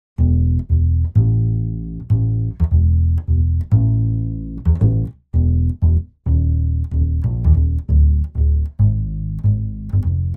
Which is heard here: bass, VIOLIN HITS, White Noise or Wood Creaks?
bass